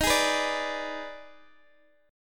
D sharp Major 7th Suspended 4th Sharp 5th D sharp Guitar DADGAD, chord; x,x,13,13,14,12; chordshape